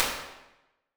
Drum Plate; Medium Spaces; Plate Reverbs; Impulse Responses; Apple

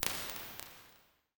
Jazz Vocals, Impulse Responses, Rooms; Large Spaces; Apple

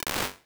Warm Slap; Small Spaces; Apple

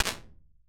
0.7s Bathroom Reflect, Impulse Responses; Small Spaces; Indoor Spaces; Apple

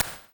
Organ Room, impulse responses, warped reverb; Small Spaces; Apple

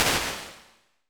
Tabla Plate; Medium Spaces; Plate Reverbs; Impulse Responses; Apple

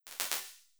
Slap Room; Small Spaces; Apple

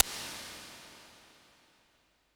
Perc Plate; Large Spaces; Plate Reverbs; Impulse Responses; Apple

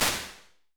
0.8s Small Wood Room, Impulse Responses; Small Spaces; Indoor Spaces; Apple

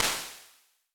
House Room, Impulse Responses, Indoor Spaces; Medium Spaces; 1.0s; Apple

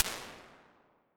1.4s Garage, Impulse Responses; Medium Spaces; Indoor Spaces; Apple

